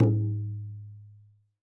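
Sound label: BANYA right; WorldSounds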